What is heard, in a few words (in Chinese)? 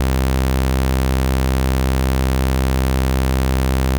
Model E C1的锯齿波; 过于稳定的声音